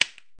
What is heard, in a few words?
click spin